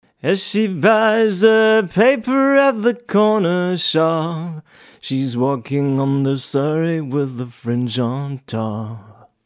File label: mic2 solo, Bariton